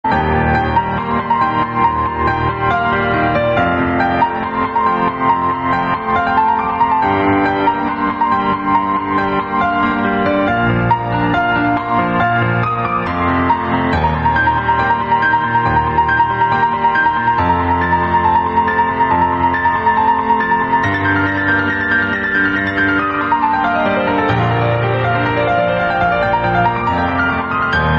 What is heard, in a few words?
iphone alarm instrumental music